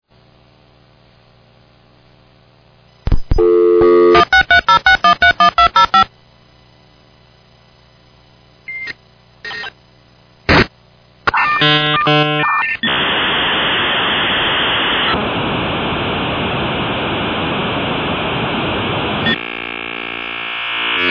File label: Dial Up Modem